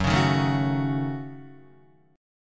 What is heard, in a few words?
Gb Minor 6th Gb down Guitar; strummed; JGuitar